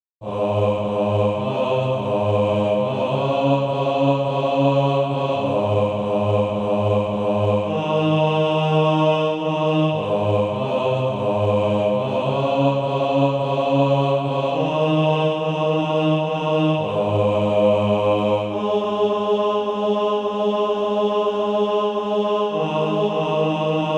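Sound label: bass, Practise Music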